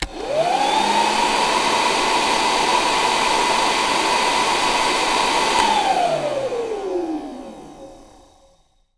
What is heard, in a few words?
CLEANER, HOUSEHOLD; 1000fx